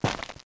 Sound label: Step